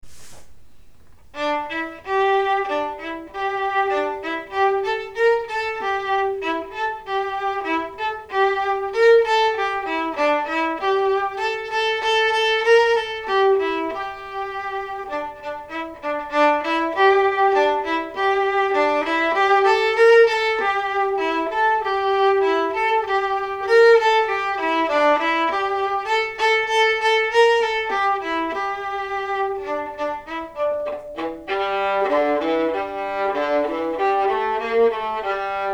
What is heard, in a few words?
viola simple blues in g